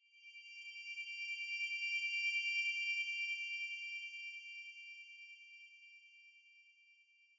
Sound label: rip